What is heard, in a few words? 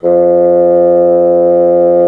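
wind bassoon F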